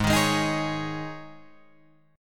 G sharp Suspended 4th, Guitar, Open F, G#sus4 chord; G#sus4 strummed; 8,10,8,10,11,8; JGuitar